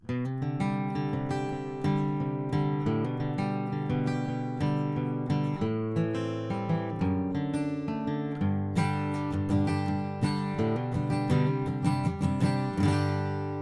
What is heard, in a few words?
Acoustic Guitar; earthworks QTC30